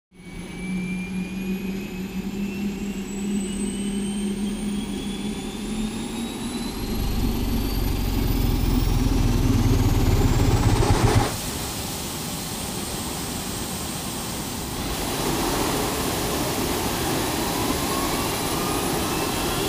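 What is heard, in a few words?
jet fighter engine start, idling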